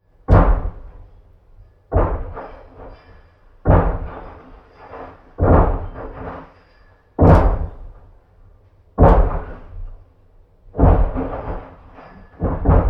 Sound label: Domestic Buliding Work Next Door Heavy Banging Hammering Rattling; Low Impact